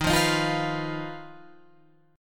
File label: Eb Suspended 2nd Sharp 5th, Guitar, Open F; Ebsus2#5 strummed; JGuitar